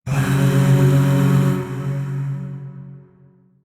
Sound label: Shawzin Hirajoshi Chord; Warframe; Zariman Void Shawzin